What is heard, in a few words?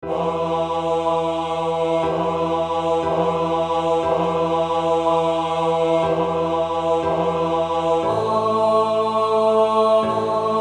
Bass; Gratias agimus tibi